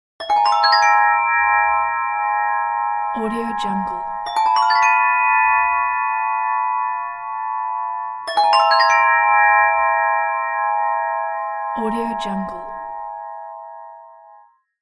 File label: magic transition slow